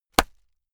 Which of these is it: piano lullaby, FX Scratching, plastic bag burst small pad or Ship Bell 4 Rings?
plastic bag burst small pad